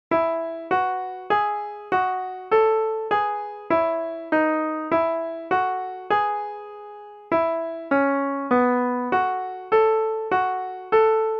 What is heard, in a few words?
morning prayer alto instrumental